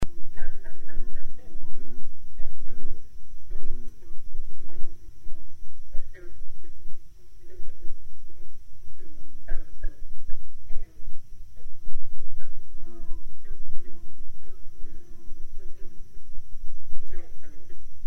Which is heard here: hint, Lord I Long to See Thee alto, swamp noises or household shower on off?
swamp noises